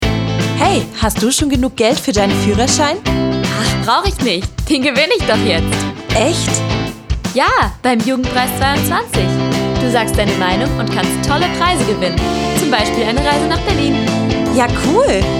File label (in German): Jingle; Jugendpreis 22